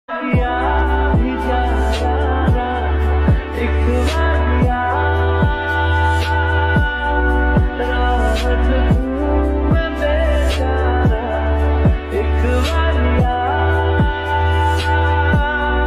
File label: reverb lofi ringtone; shushantrajputwithkrithishinh